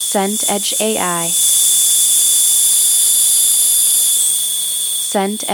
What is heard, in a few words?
cricket field night